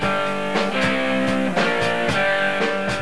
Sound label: gtr